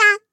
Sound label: Vo Main